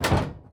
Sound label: robot step; teardown; ps5 windows xbox series xs